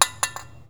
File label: Drop, INDUSTRIAL